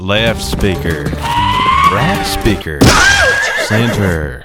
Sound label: speaker test; Golden Crayon Sounds